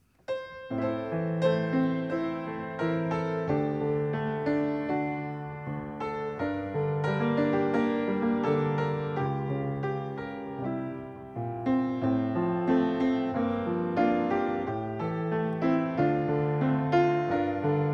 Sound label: Covenant Hymn